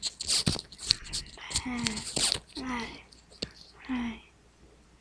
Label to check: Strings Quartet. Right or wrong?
Wrong